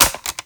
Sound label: Button